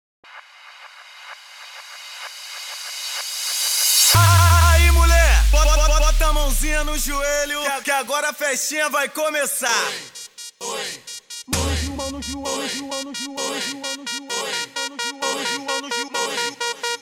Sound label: mid bass